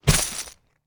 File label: gold coin